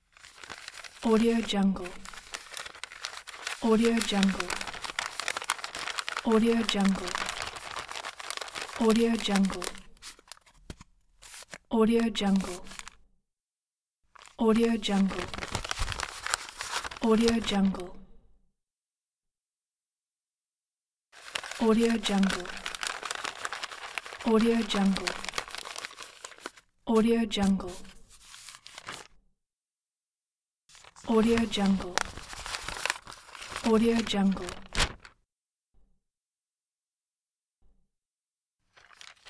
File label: rolling and unrolling paper